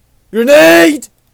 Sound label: aghh